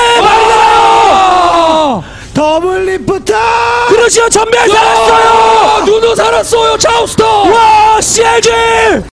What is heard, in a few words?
OHH; Doublelift